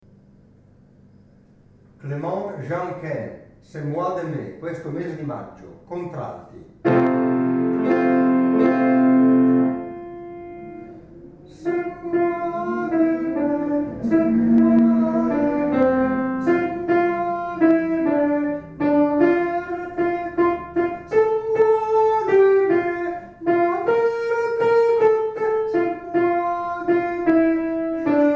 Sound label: Contralti; Ce Moys De May